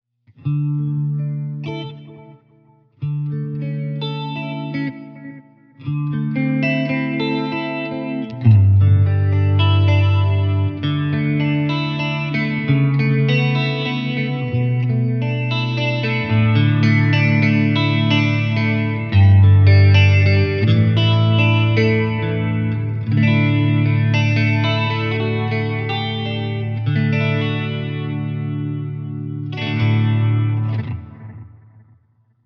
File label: stereo clean